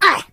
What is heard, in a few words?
Ooff, voices, Pirate; hedgewars